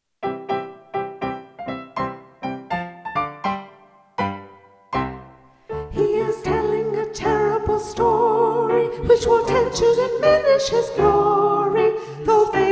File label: Soprano; Terrible Story Hail Poetry